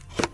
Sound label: button press; Cloudy with a Chance of Meatballs - It's Raining Man